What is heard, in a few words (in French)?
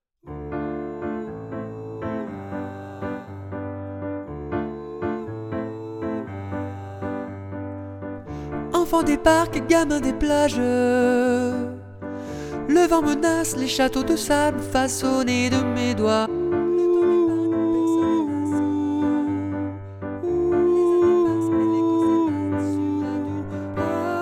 tenor